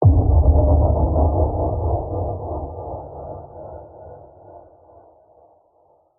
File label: Impact; PMSFX